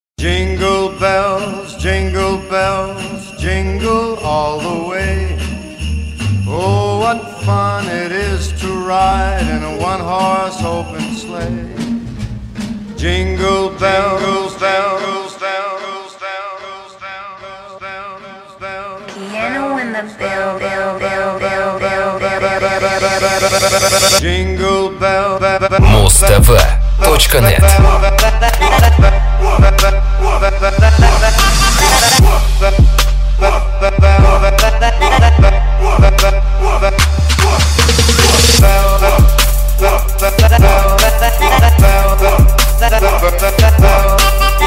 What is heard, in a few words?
Jingle Bells - Trap Remix